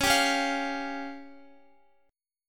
Db Major Flat 5th, Guitar; strummed

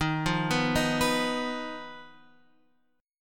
D sharp Suspended 2nd Sharp 5th

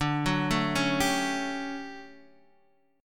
D Major 11th Guitar Standard, chord; arpeggiated; JGuitar

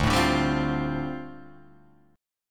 D Minor 11th D down Guitar DADGAD

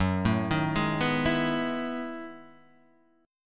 B 13th Gb, chord

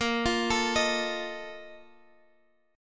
F 7th Bb; F7/Bb; arpeggiated

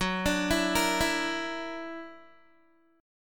Gb Augmented 7th Gb down Guitar 4E,4A,5D,5G,5C,6F; Listen to Gb+7 arpeggiated

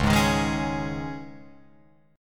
Db Suspended 2nd, Guitar, Open F, Dbsus2 chord; strummed; JGuitar

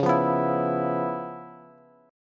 Db 7th Sharp 9th Db down Bass; Db7#9 strummed; JGuitar

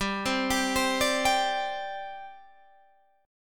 G Suspended 4th, Guitar, Half Step Down

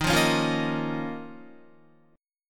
Eb 7th Suspended 2nd, Guitar; 11,8,11,10,10,10; Eb7sus2 strummed; JGuitar

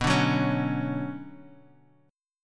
B Minor Add 9th B, chord; Bmadd9 strummed; madd9:1,b3,(5),9; JGuitar

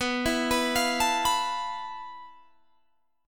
F Major 7th Flat 5th B, guitar chord

